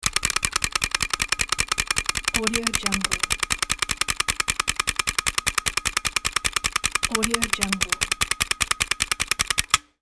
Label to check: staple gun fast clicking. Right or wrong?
Right